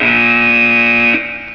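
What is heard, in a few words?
BIG BUZZER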